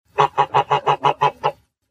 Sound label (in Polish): gęś